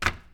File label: Magazine Hitting Surface